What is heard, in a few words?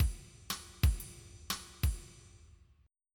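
rock swing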